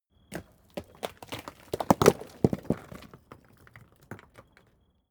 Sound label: stone medium fall long